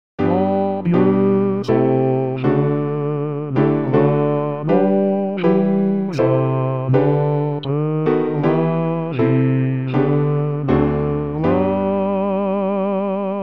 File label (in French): Basse; Grand Dieu sauve le Roi; Lully